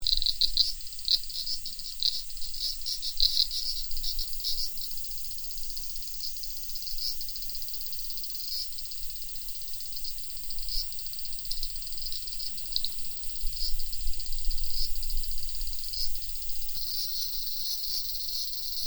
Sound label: cicadas